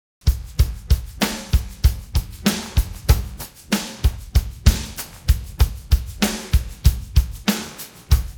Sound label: pattern, drums